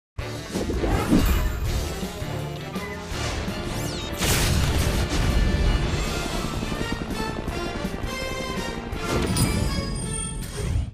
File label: Helicopter Bomb; Disney Infinity